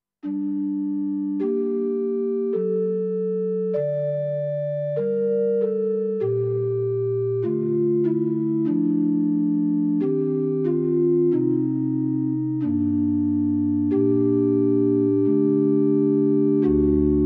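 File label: Organ Flute, digital piano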